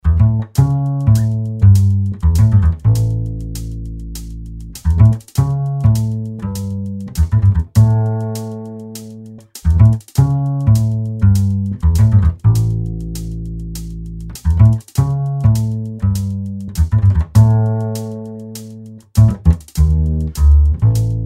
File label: BASS